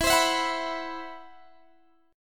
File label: C Major Sharp 11th E